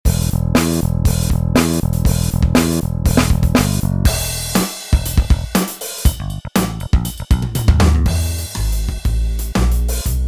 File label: Drums; Drums Only Demo Song